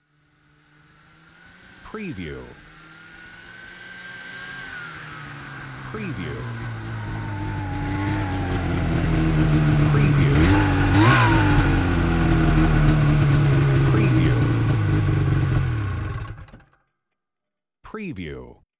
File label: snowmobile arrive and turn off